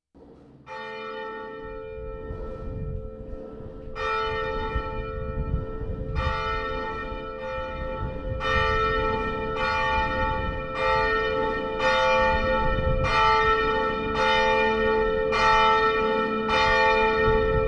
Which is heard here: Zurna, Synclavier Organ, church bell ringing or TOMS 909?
church bell ringing